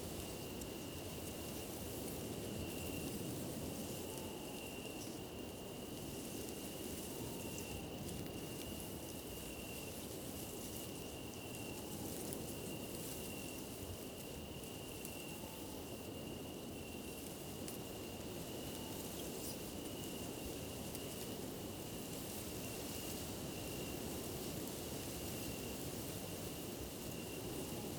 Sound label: outdoor forest night; anemoi